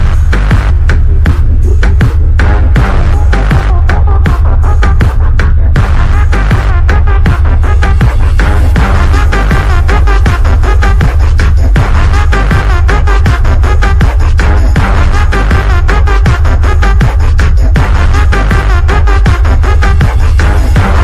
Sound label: bass boosted